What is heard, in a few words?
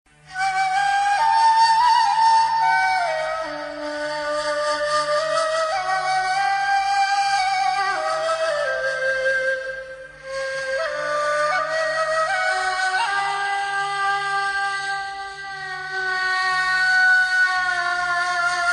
Arabian Flute